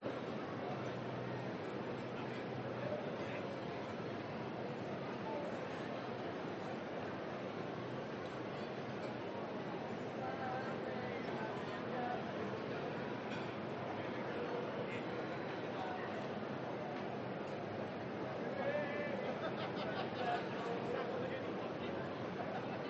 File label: BALCONY Evening Restuaurant Sounds People Sing in Spanish Distant Voices; Light Traffic; gain walkers